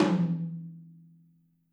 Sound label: Drums Percussion, Kit1 Room Mic